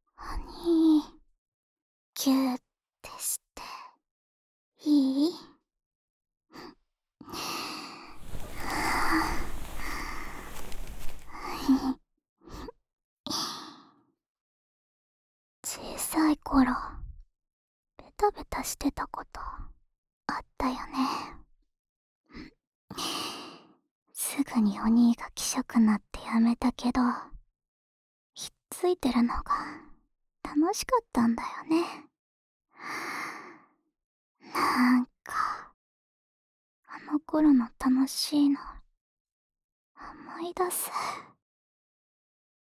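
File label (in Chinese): 舔耳（左右耳）, ASMR; 舔耳大腿自慰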